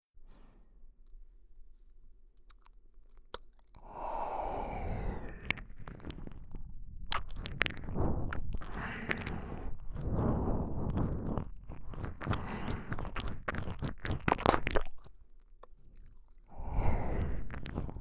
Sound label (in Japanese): 深い耳舐め 右; オナサポループ